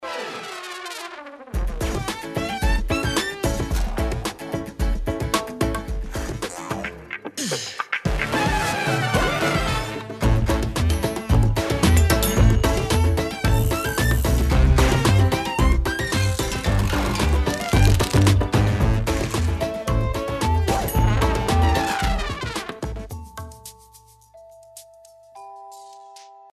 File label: End Screen Music; 101 Dalmatian Street Boom Night Rescue